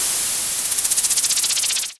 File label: Synth FX